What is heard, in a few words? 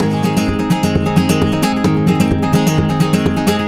130 G# Rumba; Spanish And Gypsy Traditions; Soundscan vol.59; AKAI